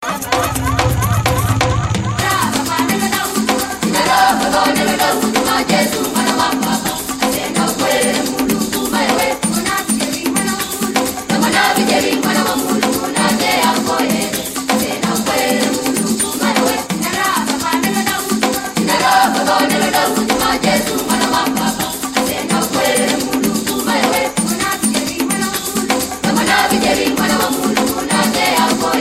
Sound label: Afrika Beats